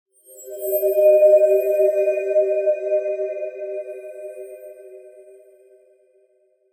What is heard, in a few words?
ghost; gesture